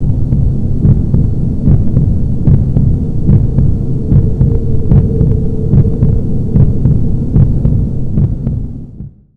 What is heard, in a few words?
effect, flashbang; Weapons; WarRock